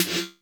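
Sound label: FX ROOM Snare 808; Masterbits - Soniq Elements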